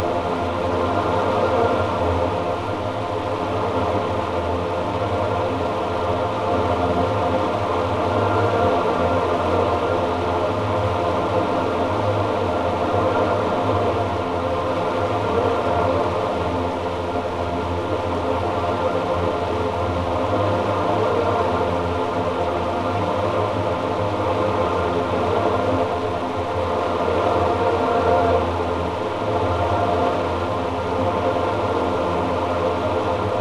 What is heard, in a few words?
3 Drone